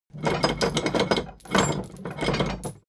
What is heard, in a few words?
drawbridge winch hoist erratic; maschinen; metall; soundpool